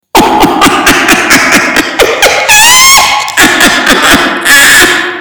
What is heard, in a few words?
evil giggle